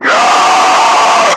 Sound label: Screamer Death; Warframe; Lasrian